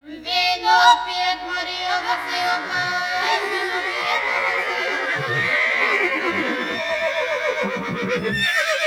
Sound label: bulg horse